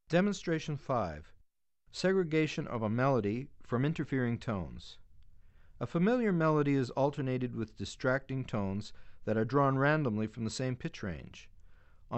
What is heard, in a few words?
Segregation Of A Melody From Interfering Tones; Bregman ASA; auditory demonstrations